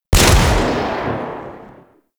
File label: Revolver Rifle Shot; Warframe; Tenno